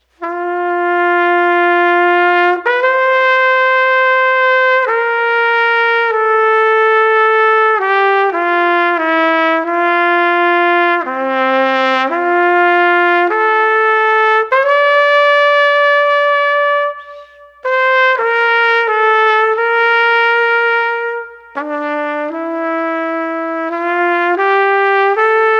Street Trumpet Blue Melancholy 94

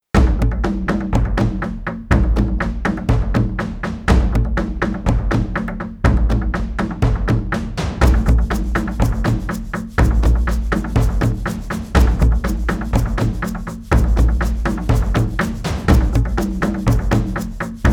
Percussion Only 122

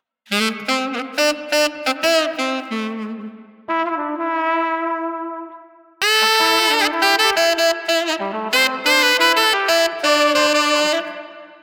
Flugelhorn Sax Spontaneous 90

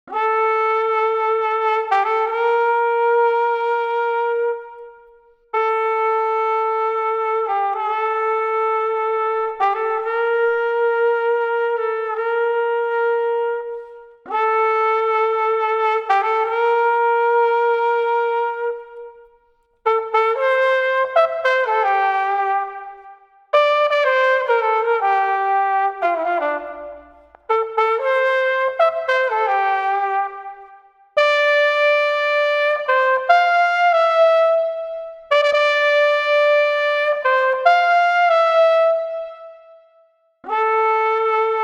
Street Flugelhorn Lament 110

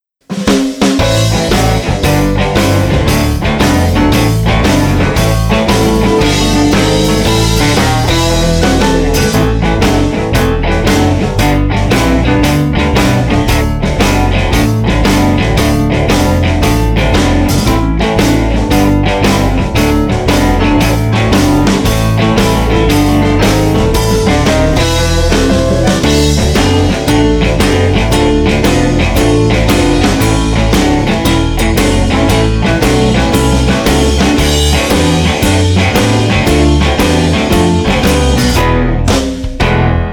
Blues Rock Barroom Shuffle 115

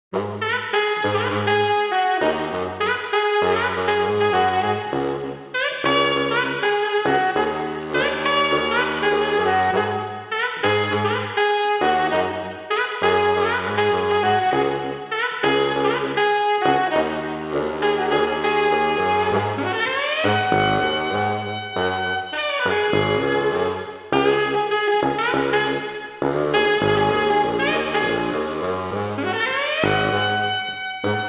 Street Baritone Tenor Sax Combo 100

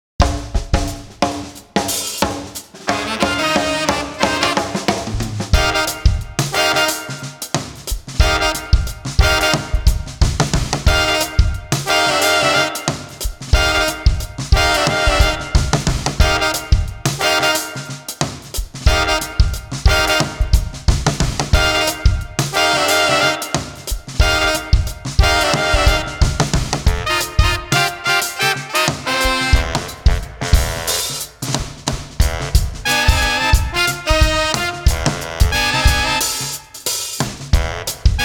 Street Brass Combo Funky Krewe 90

